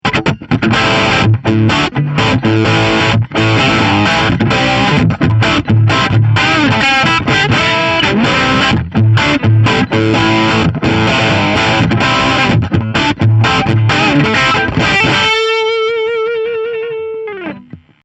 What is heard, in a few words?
VOX AC100 Crunch